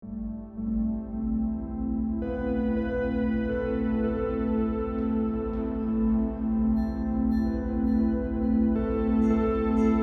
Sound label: ambient